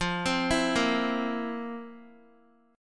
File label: F Major 7th Suspended 4th, Guitar; arpeggiated